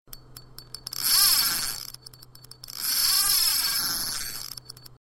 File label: Reel Drag